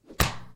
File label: Whack